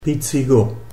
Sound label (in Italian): pizzico